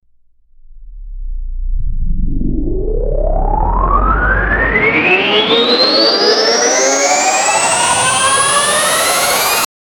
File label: techno disco powerup